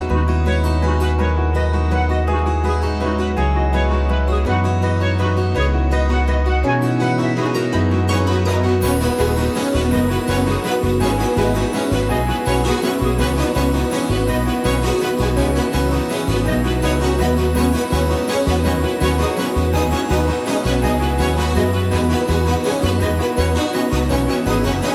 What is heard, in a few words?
Instrumental Tone Ringtone